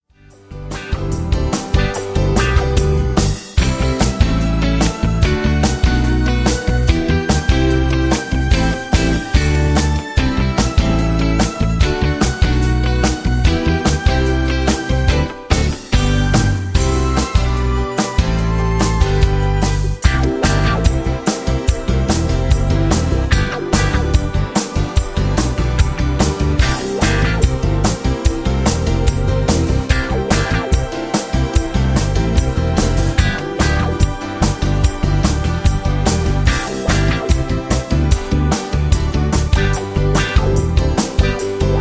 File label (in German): alle menschen duerfen sehen playback